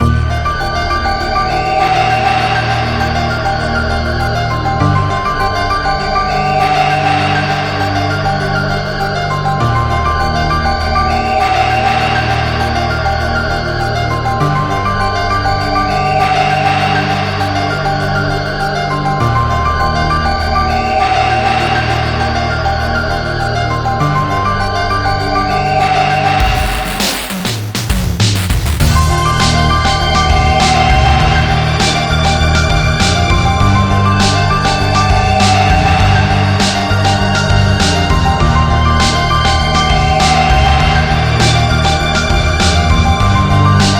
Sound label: suspense, music; daily life